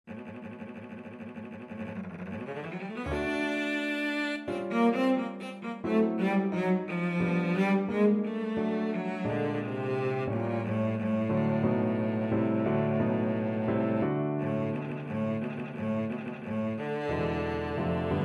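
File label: violoncello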